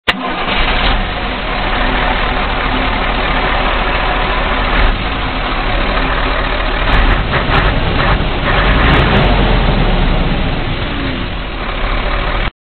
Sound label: motorsound, trucks